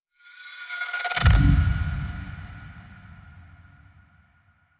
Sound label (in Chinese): 扭曲切换画面